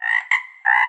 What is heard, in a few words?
frog; animalia